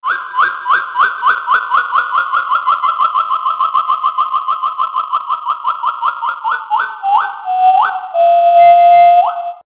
ringer; Ringtones

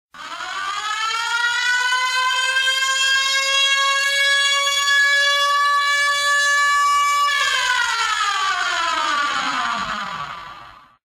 Siren

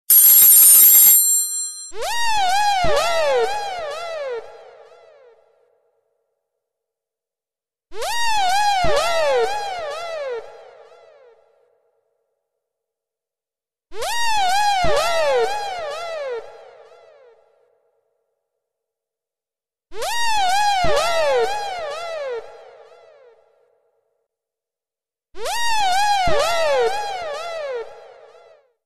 Synt Square Two

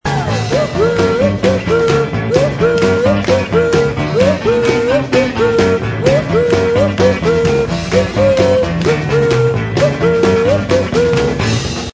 Woo Hoo

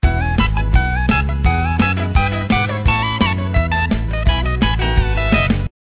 ringer; Ringtones

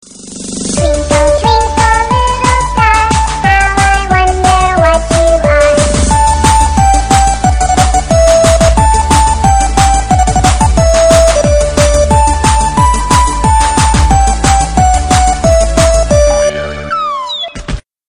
Twinkle Twinkle, Ringtones

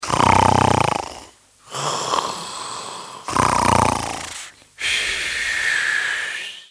Whirrs

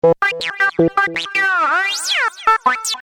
Synth

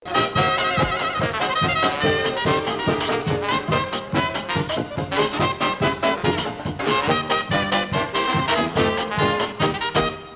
ringer; Ringtones

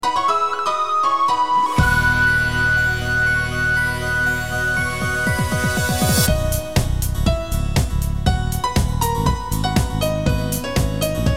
Theme Ringtone; Wii Sports

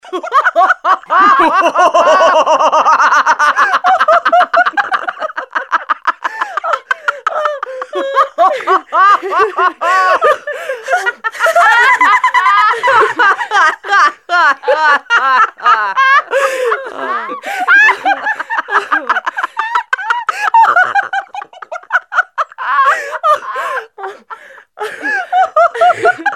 Laugh; Ringtones; Womankind

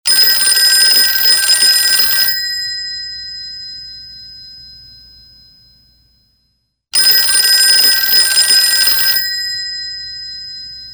Telephone Old Style

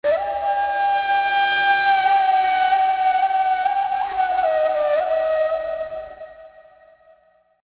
ringer; Ringtones

